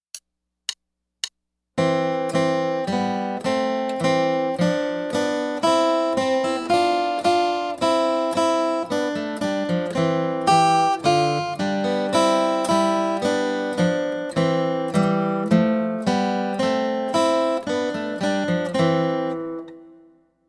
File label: Tallis Canon